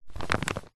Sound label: paper sheet on soft surface hand rub over crumple rustle lightly